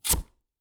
Cutting Board Vegetable Chop; soundscrate

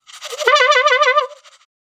Bycicle Horn; SoundsCrate

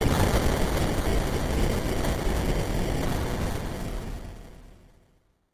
8 Bit Explosion; SoundsCrate

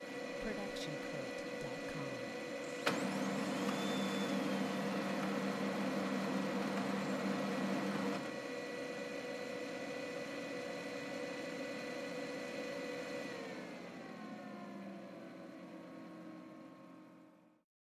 printer starting; soundscrate

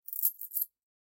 Chain Foley; SoundsCrate

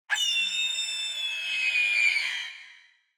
falcon; soundscrate

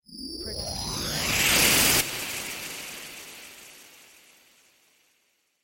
Quirky Charge Up; soundscrate

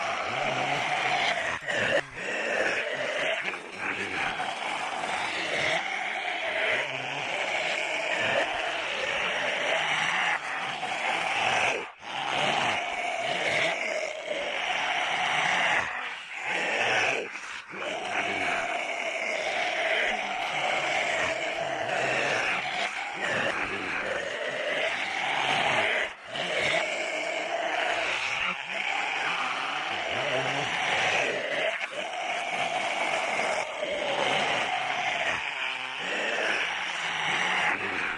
zombie; soundscrate

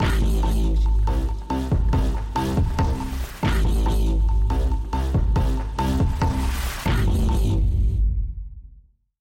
bass trap logo

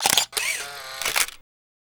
camera shutter polaroid

